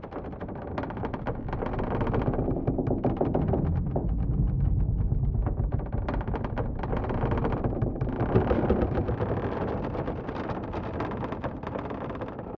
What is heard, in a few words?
Dock Creaking; silent hill homecoming 2008 gamerip pc ps3 x360